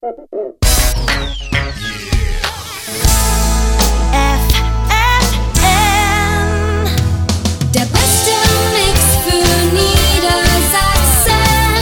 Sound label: jingle; ffn